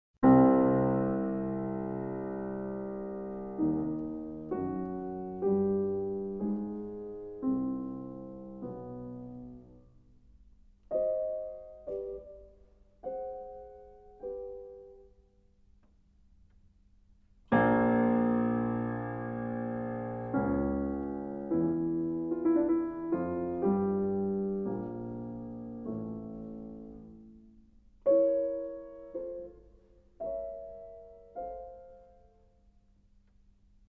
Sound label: piano